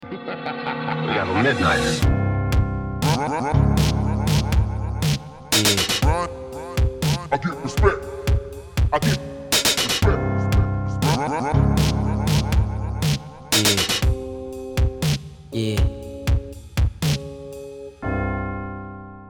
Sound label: Savage comeback thug life style; social media